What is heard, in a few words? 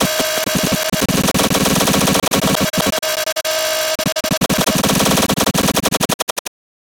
Glitch Error Malfunction; MatiasMacSD; INACCURACY (GLITCHES)